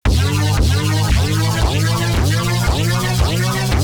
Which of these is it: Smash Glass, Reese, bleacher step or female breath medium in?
Reese